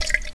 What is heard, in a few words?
whale oil